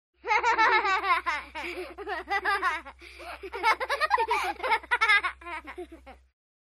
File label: Laugh; Diddy